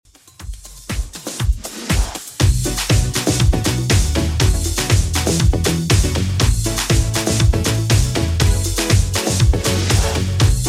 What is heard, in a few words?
80s Redrum 120bpm